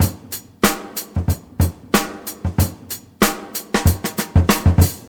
93 bpm drum beat f sharp key, kick tuned to the F# note. Loudest frequency: 2373Hz